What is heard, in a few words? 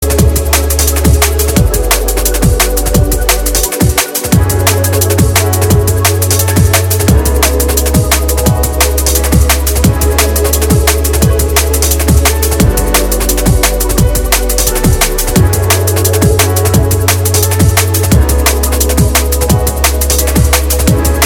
Bass Station DnB, RECORDED RADIO